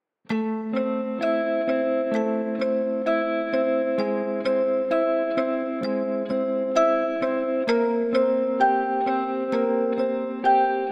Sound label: Thread, No Vox